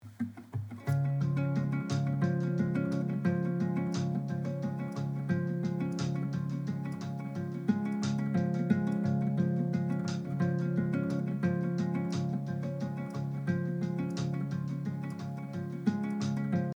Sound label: Tilt-A-Whirl GUITAR W SWATCH W HIHATS; wristwatch